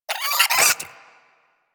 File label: Vocal Attack Short, Hunting Critters